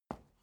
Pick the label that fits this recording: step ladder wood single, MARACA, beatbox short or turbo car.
step ladder wood single